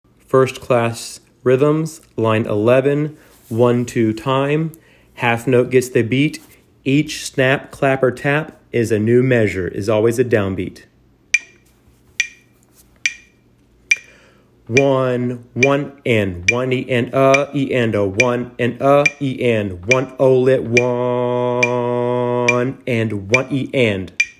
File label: rhythms